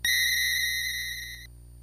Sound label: alert cheer